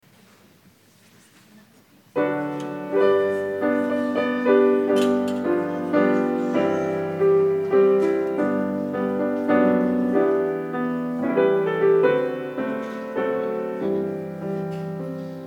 Orchester; Lagerversammlung 2016

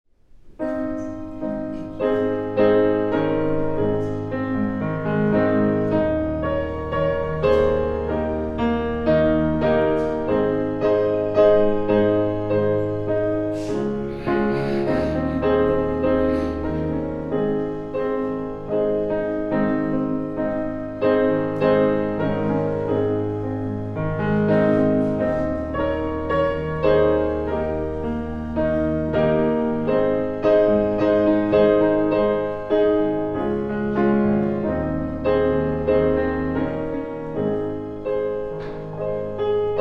Vorspiel

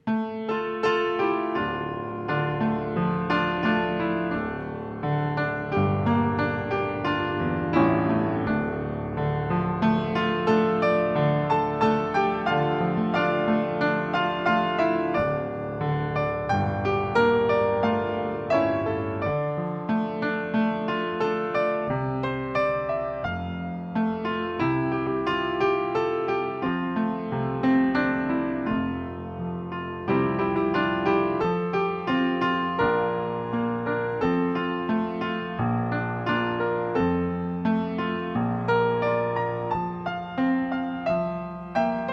Vorspiel

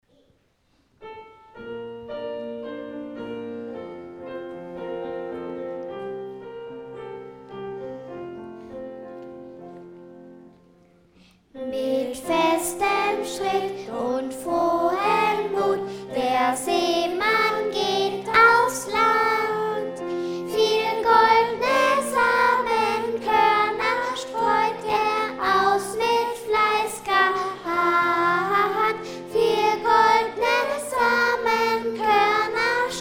Kinderlied; mit festem Schritt und frohem Mut

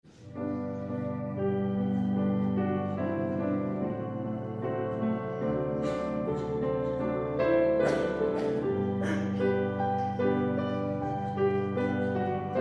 Vorspiel

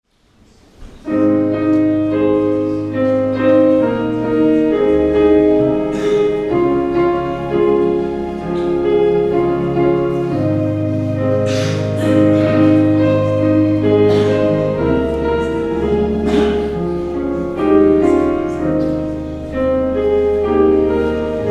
Vorspiel

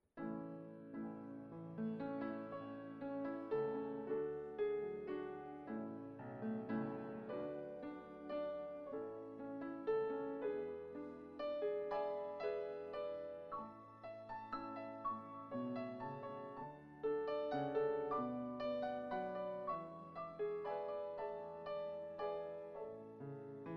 Vorspiel

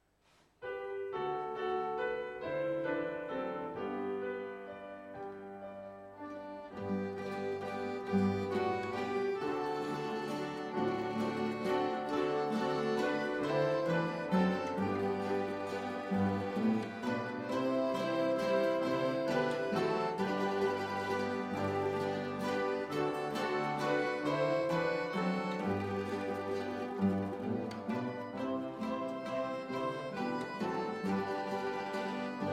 Vorspiel

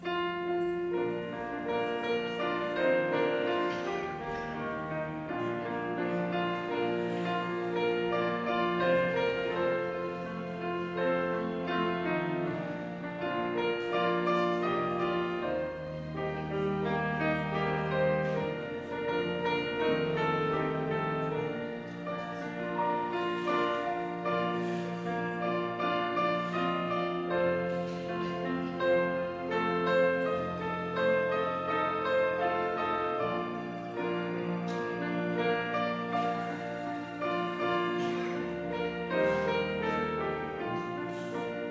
Vorspiel

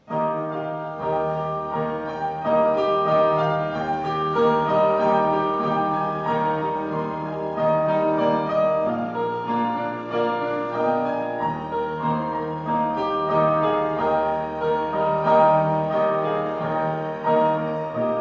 Vorspiel

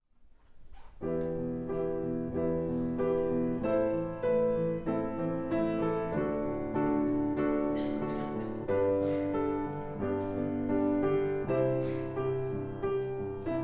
Kinder Orchester

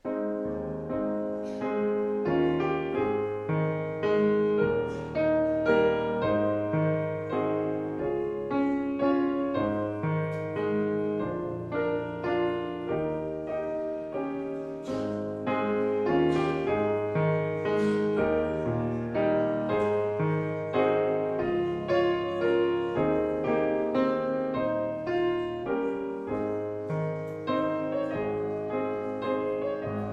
Vorspiel

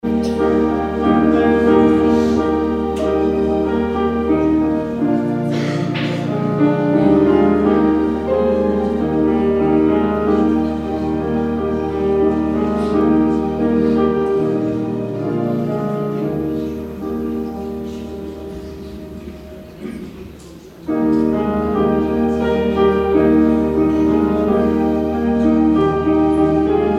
Vorspiel

